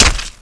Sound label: knife hit; svencoop